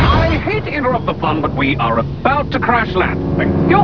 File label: Crash Land